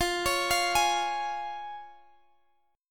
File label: F Minor Sharp 5th, chord; x,x,x,x,17,20,19,17